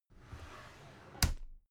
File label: drawer cabinet carton open regular